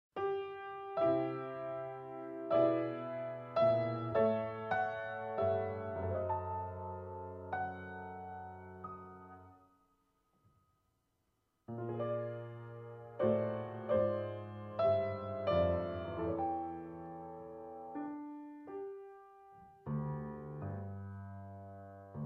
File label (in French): coq tutti